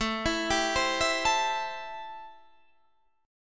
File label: A-Minor 7th A Guitar Drop D